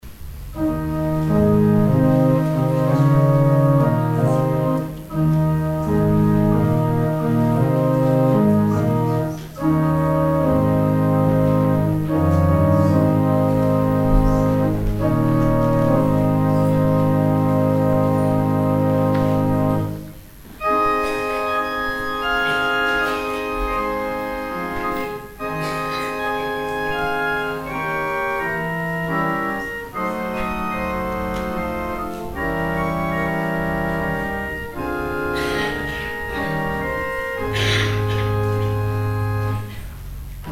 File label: organ interlude; St. Mungo's